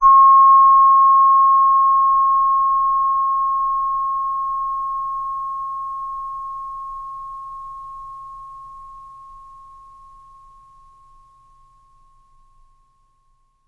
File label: chime High